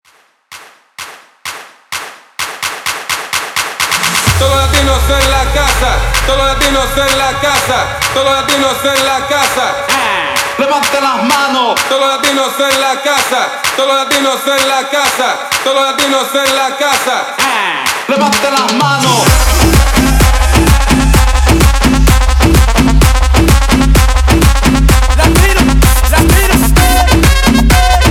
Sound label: Clap Starter Hype Latino Edit; 129 BPM